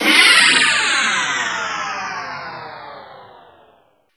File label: STAB, SPACE